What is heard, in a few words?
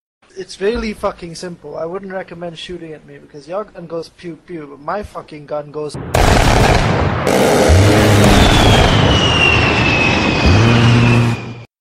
aggressive sound